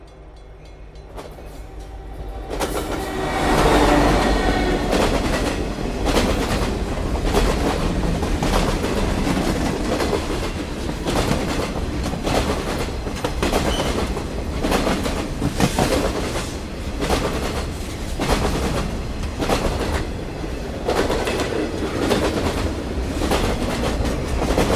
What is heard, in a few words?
train passing by